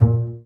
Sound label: BA PIZZ, Orchestra; Best Service; Peter Siedlaczek's Advanced Orchestra